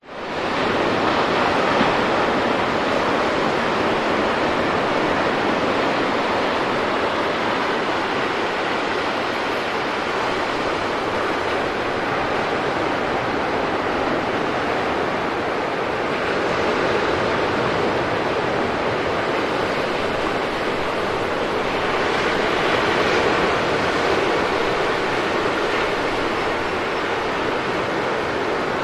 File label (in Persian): sedaye darya